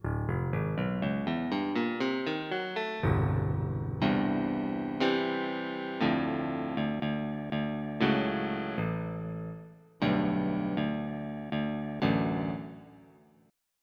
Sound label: Brilliant Gtr